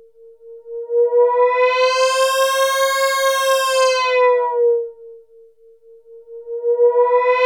MOSQUITO, ANALOG SYNTH; 1000fx

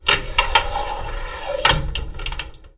BIFOLD, Doors; 1000fx

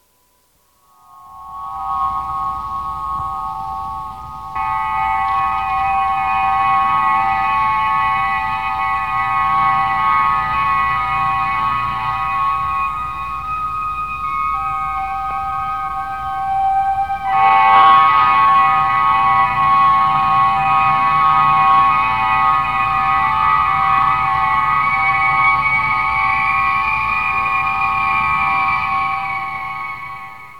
Materialization; Star Trek Original T. V. Series Sound Effects

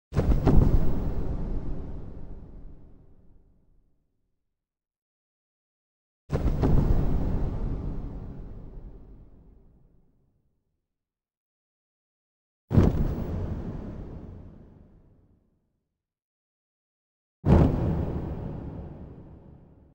Parachute Opening with Reverb; Hollywood Edge Sound Designers Tool Kit

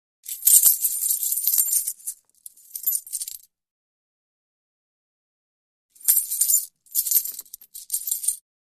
Coins Jingle; Hollywood Edge Foley Sound Library

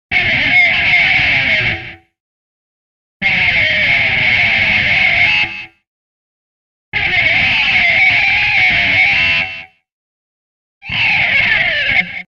Pick being scraped down an electric guitar string; Music effect; Hollywood Edge Sound Designers Tool Kit

